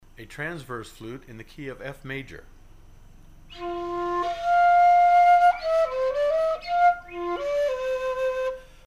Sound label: FLUTE F